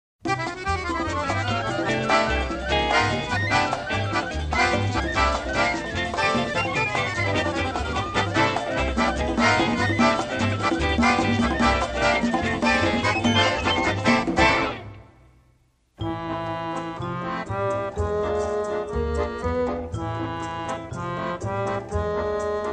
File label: CONGAS